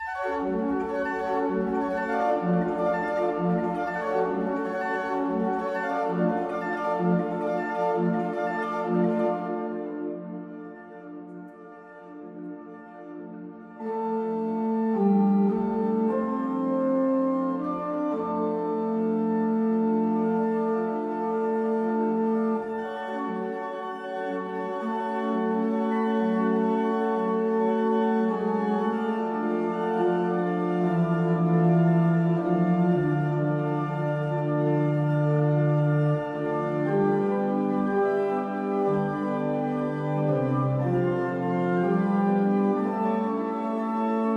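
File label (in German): Meditation und Toccata, Orgel; Ostern; EG 99; Christ ist erstanden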